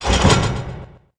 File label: Pirate Ship Catapult Away; Zelda